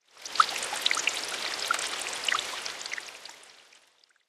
rain on water; factorio

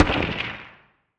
asteroid damage carbonic small; Factorio

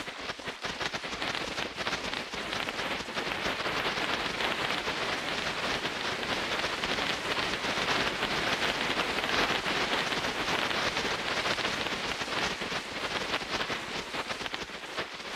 ash cloud, smoke; factorio

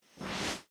spawner respirator pull; Factorio

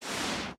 spawner respirator push; Factorio

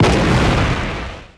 space platform explosion; Factorio

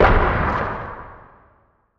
asteroid damage interstellar big; Factorio

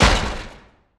asteroid damage metallic medium; Factorio

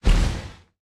asteroid collision oxide small; Factorio

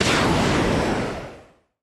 rocket turret launcher; Factorio

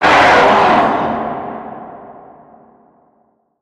demolisher death, enemies; Factorio

